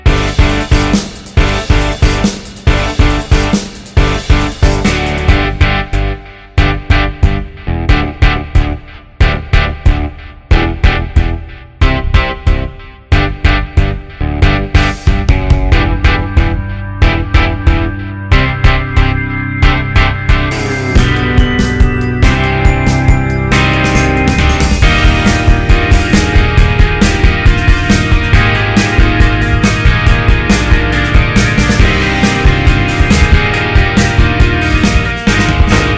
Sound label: karaoke